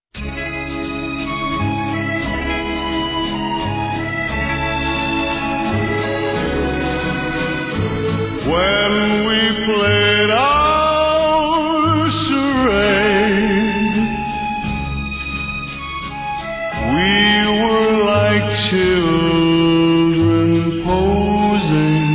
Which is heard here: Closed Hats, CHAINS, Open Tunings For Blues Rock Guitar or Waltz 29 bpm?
Waltz 29 bpm